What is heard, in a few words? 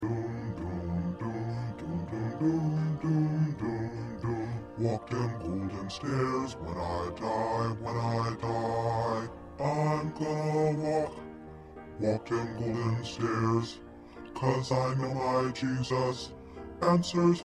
Bass